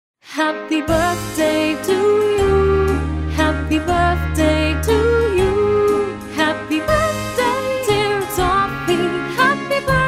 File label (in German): happy birthday sophie